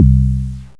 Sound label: bang bass